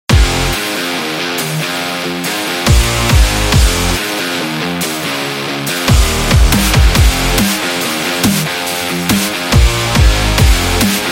Action Sport Driving Rock, Aggressive background music